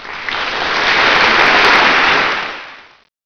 beifall

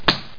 Karte ausspielen; Skat; Skat 3.0 Demo

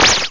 schwerer laser; galagawars

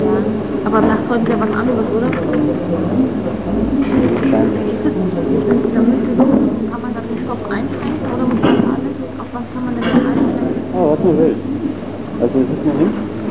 gemurml

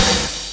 becken

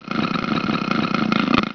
SCHNURR

